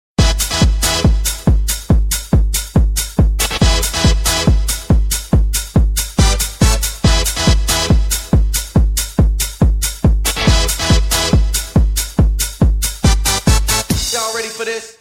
Electronics

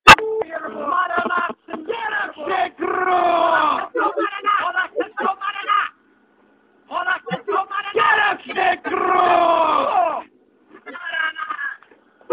Blood Tone Theme

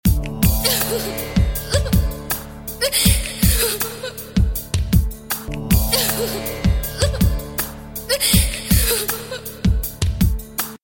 Girl Crying

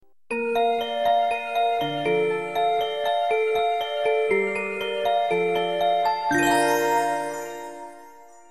Train Song; Saitama

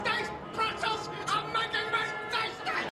Yell

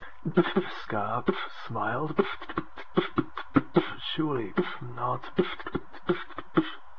Beatboxing To Ska

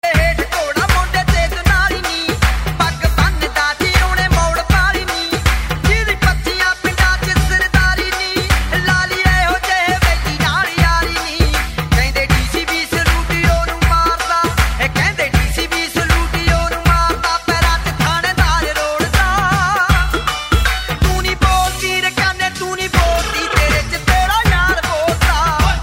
Punjabi

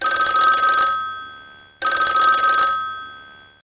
Old Style UK ringing

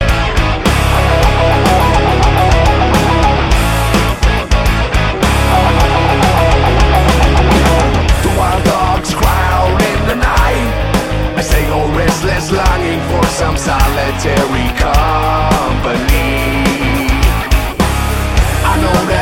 Metal Cover

